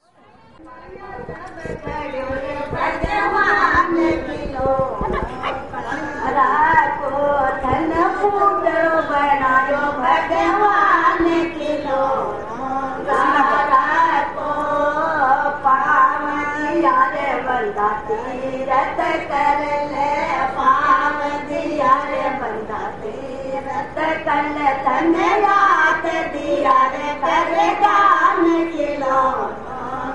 street singing in the wee hours, Field Recordings from India; WFMU